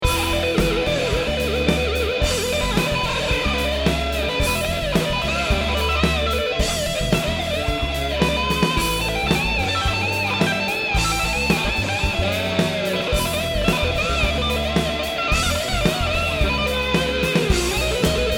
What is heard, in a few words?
solo